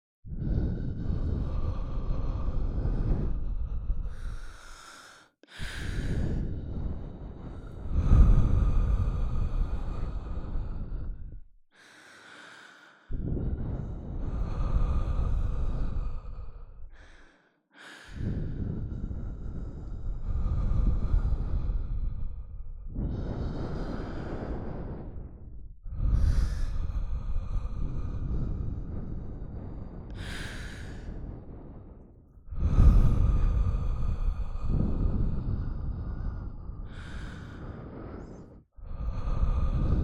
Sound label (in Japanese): 吐息 耳ふー×耳はぁー